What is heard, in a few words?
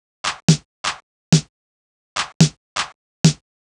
drum loop room percussion 125, Classic Chicago House; Sample Magic; Drum Loops